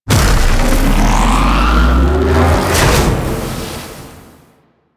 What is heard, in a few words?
Void Dragon Body Ring Cast; Enemies; Abilities; Warframe; Duviri